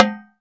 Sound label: Oct Tom, Roland SPD-S